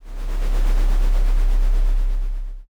earthquake

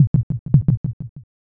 heartbeat

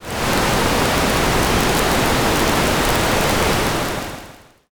fire

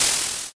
curtain

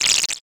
text anim

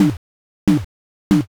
hammer

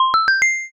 asia xylo

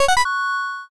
rank up

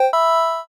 news title

